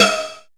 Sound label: Shock Snares, Percussion; Dance Synths